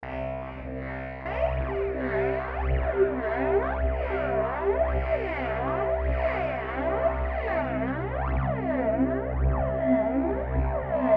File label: MicroFreak Sirens; MicroFreak Expressions; Sirens Varicaciones